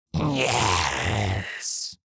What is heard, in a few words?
Demon beast imp monster sigh yes